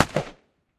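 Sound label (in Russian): приглушенный отдаленный выстрел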